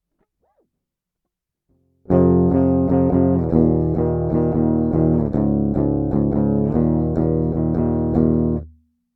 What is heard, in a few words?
Rhythm guitar